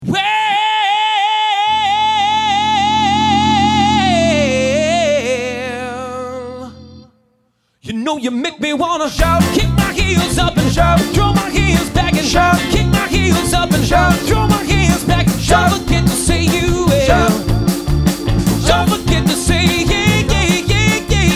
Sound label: Shout Sample; MICS